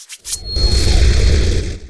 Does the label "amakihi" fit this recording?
No